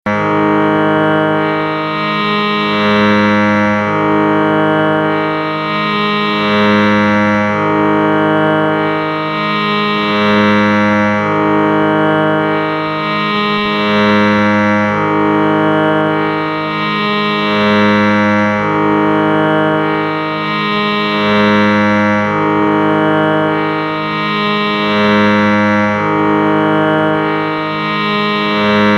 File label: Sruthi G Sharp